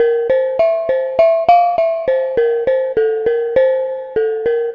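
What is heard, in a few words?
Pelog Bonang Melody; Melodic; Ambient-Melodics-II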